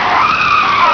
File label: ski; NewSurfs